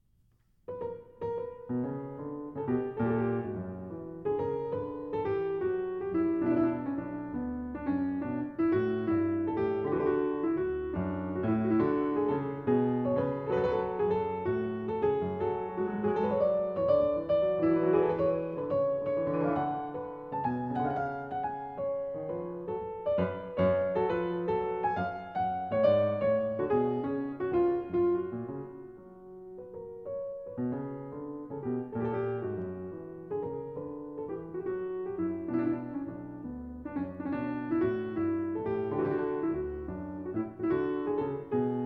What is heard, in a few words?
French Overture In B Minor